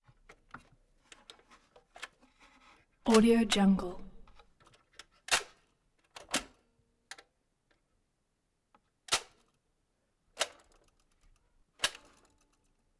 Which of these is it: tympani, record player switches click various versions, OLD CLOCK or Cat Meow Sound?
record player switches click various versions